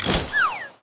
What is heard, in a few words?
Voldown; Friday Night Funkin' - Vs. Ross